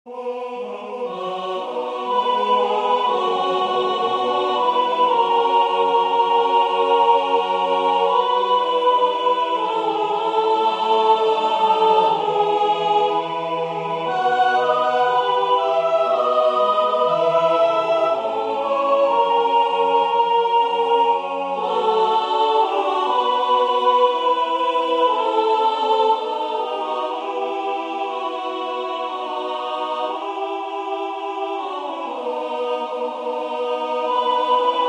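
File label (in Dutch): Soprano, koor; H.Schutz; Nonne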